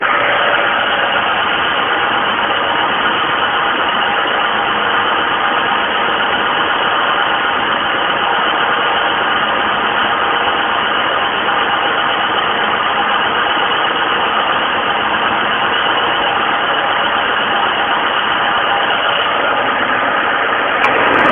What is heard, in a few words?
ticking chattering noise from motor